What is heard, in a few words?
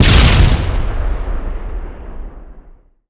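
grenade explode; Explosions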